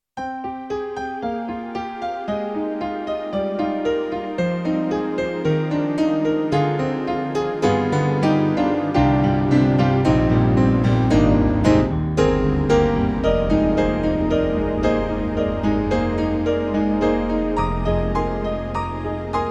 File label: YAMAHA MOTIF-RACK ES Piano Back